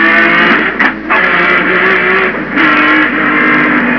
bmw hit

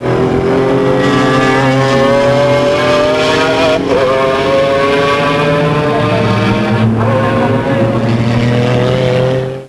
mclaren

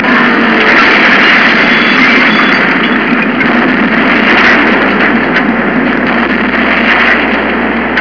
crash it

